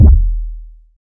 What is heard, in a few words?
big bass drum single hit d sharp key; kick drum